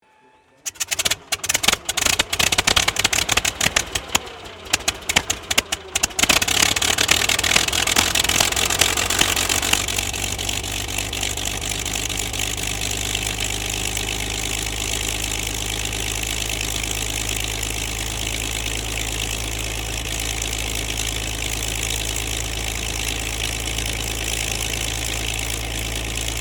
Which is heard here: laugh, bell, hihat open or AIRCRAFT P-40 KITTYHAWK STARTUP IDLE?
AIRCRAFT P-40 KITTYHAWK STARTUP IDLE